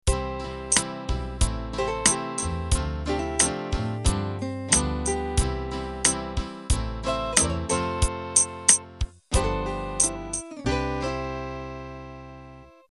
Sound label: 8Beat slow 70s End